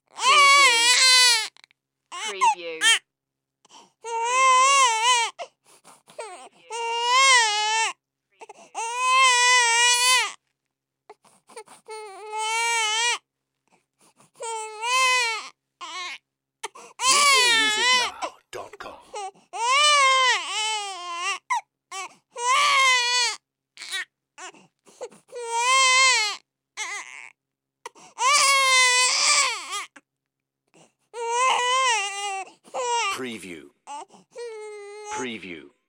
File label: Baby Crying Long; sound effects bible